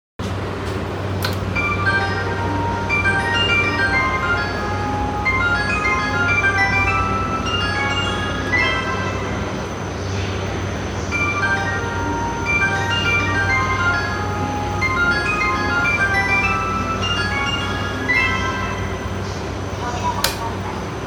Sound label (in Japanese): JR 山手線 東京